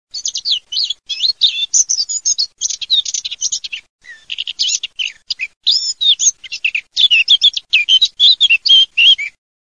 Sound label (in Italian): CAPINERA